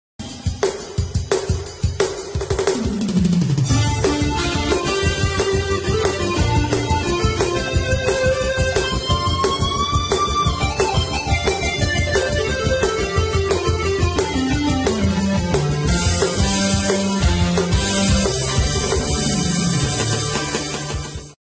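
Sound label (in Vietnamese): Nhạc mở màn chào mừng MC đám cưới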